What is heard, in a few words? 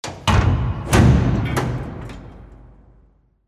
DOOR METAL